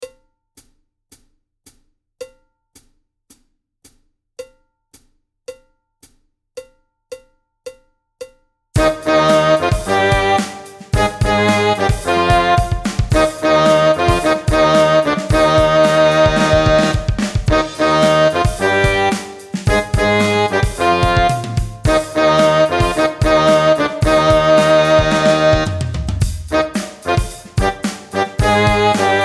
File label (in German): Zweistimmige Uebung nur 2. Stimme, brass; Brass Online – Grande Fanfare